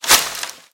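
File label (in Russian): охапка сухих листьев подброшенная вверх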